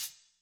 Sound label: hihat; pasific; Pop